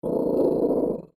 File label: dog growl